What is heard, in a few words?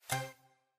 pop up